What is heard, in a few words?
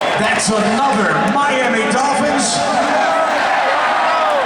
touchdown; miami dolphins